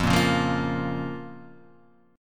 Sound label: F Suspended 4th Sharp 5th; strummed; x,x,3,3,1,0,0,2; JGuitar